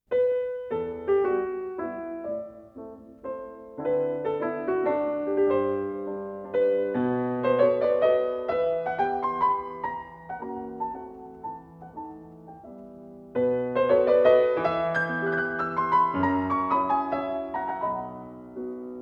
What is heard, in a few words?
Mazurka in E minor, Piano